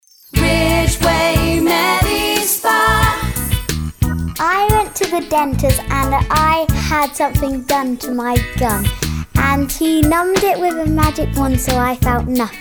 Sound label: jingle; Mooncalf Recording Studio